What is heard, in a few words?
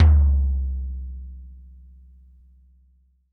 TD-20 Tom A, Roland TD-20 Toms